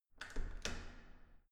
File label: entrance door handle use normal